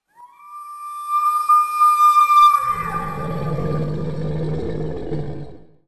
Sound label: wendigo screech